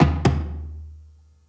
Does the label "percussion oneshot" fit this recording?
Yes